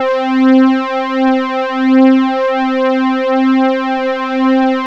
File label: poly synth; Sound Gallery - Charged; POLY SYNTH 1